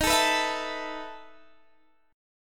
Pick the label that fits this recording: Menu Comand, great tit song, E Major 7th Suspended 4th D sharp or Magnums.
E Major 7th Suspended 4th D sharp